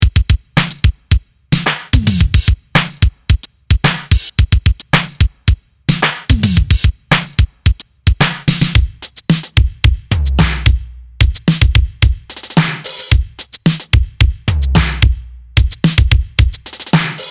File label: boggie funk